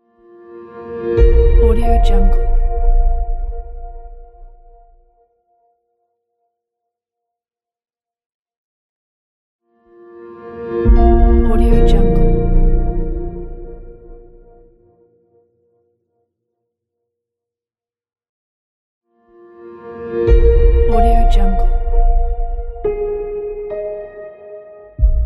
piano logo

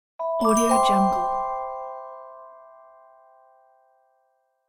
incoming; winds productions

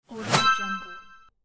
star whoosh

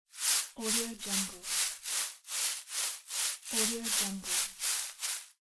leaves quick raking

